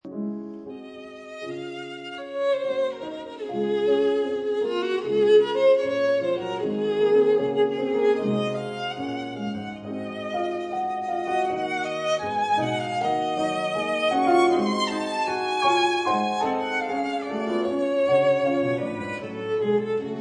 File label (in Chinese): 管風琴; HORTUS